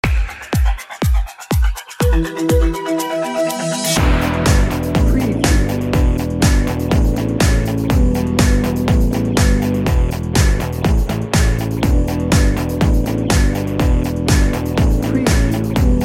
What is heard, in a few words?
radiant energy bed